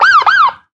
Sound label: police siren